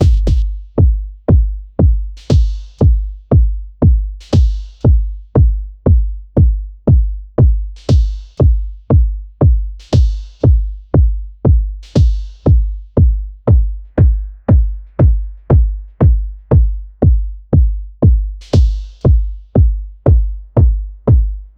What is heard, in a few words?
dub techno random classic, deep house